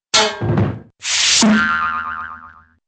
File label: clank bodyfall scrape and boing